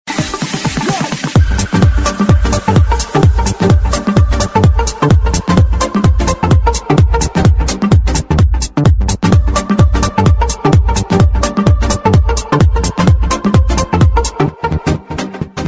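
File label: Super Disco Tune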